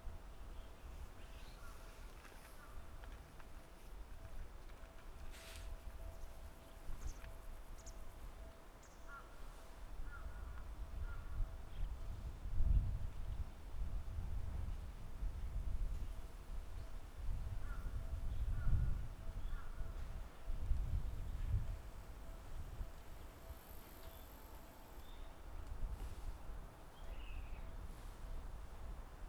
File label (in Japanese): FRONT NORM, 下り電車通過。; H2essential MS内蔵マイク指向性90°＋; ZOOM ヘアリーウィンドスクリーン WSH-2e; 録音したファイルを、本体でノーマライズしました。; ZOOM F6 MultiTrack Field Recorder